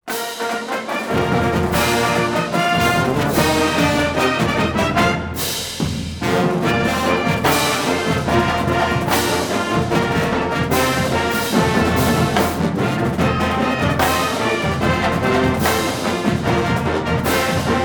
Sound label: fight song short, marching owls; FAU